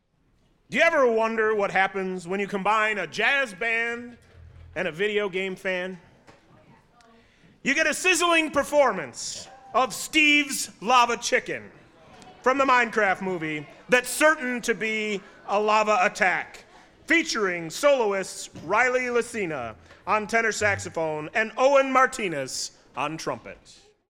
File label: Jazz Intro